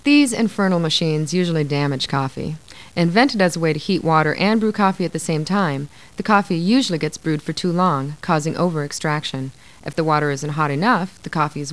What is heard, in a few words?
perc